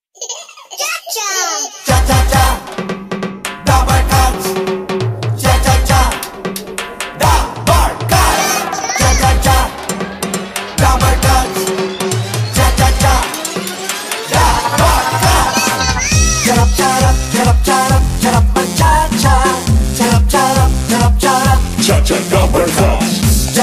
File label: ringtone